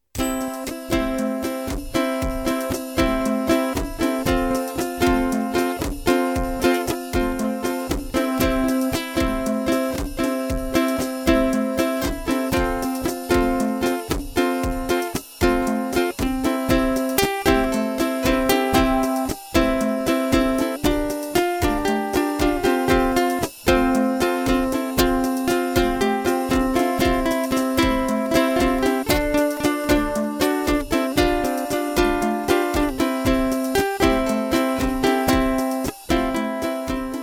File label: Ukulele